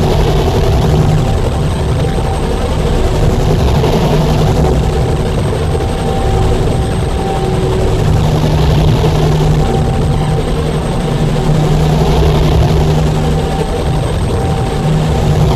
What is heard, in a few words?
blue turbine loop mid; Titanfall 2